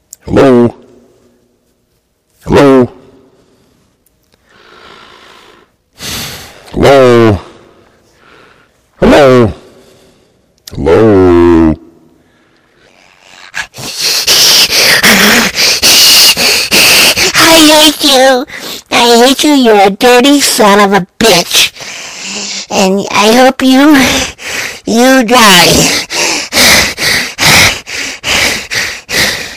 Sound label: phone